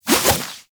Add Mineral To Meal Throw Whoosh; Alchemy Lab; Warframe; Hub; Liset

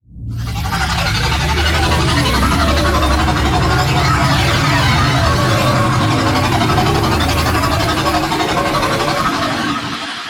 Liset Extraction Depart, Vehicles; Warframe; WM Liset

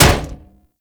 Staff Impact Armour; Warframe; Weapons; Impacts

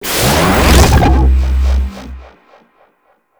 UI Crafting Start

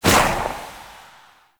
Priest Pistol Shot; Warframe; Tenno

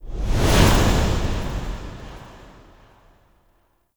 Camera Spot To Drifter; Warframe; Lotus Eaters; Cinematics

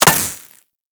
Laser Impact Metal; Warframe; Weapons; Bullet Impacts

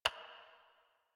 Computer Keyboard Stroke; Warframe; NineteenNinetyNine; Messenger

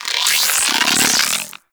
Charge, Weapons; Warframe; Infested; Quanta

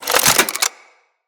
Rifle Reload; Weapons; Bolto

